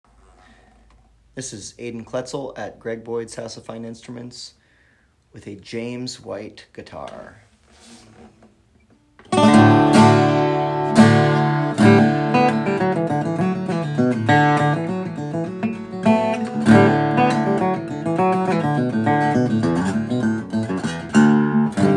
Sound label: guitar